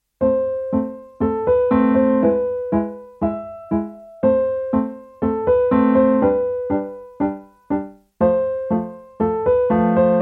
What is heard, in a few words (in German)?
Erinnerung an einen Tango, Endtempo